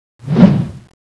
attack

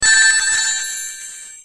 ring drop; kz unreal

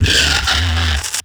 guard idle

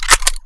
bolt slap, weapons

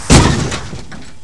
crash, carmod

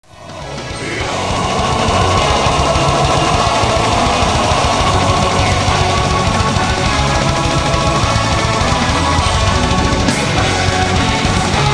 from afar; stasi sounds

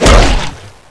attack bomb

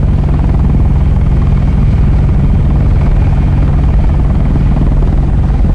heli sound

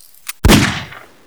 revolver; tetik

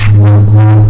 push; mekko

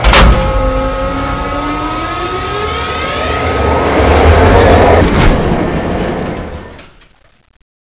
train start

